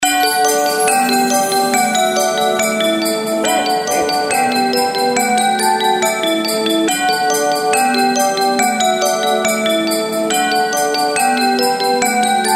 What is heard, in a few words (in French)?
AMBIANCE; pack noel; SOUND-DESIGNS